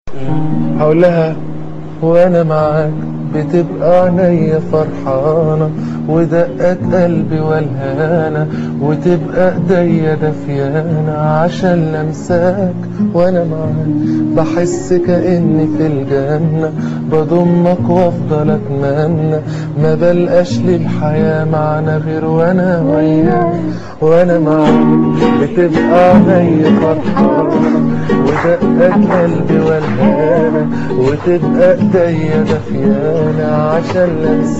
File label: Guitar; egypt